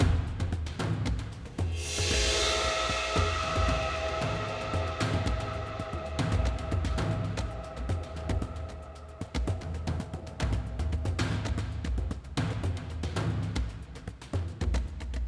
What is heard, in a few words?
114bpm